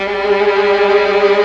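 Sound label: MINOR TRILL G3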